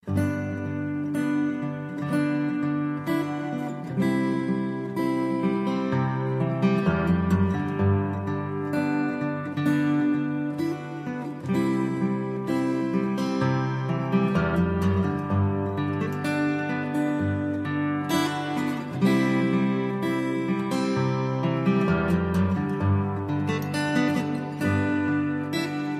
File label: guitar cover